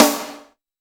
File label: Boss DR880 snare; drums